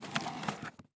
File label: Paytable Open Close